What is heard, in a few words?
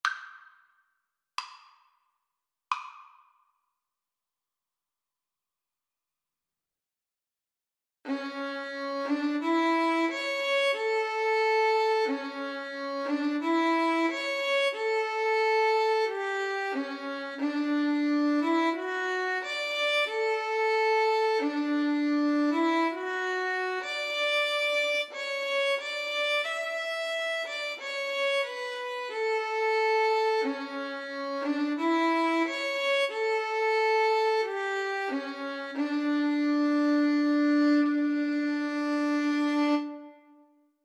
jodler Violin duet; pongauer